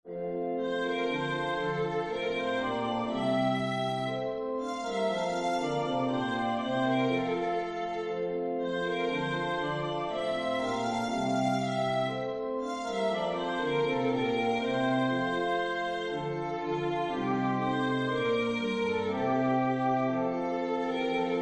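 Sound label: C instrument playback; Christ The Lord Is Risen Today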